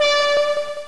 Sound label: klaxon, Train; OpenBVE; Carmillon